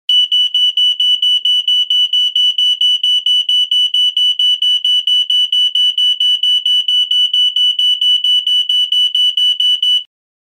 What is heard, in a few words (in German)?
Electronics Ei650 Alarmton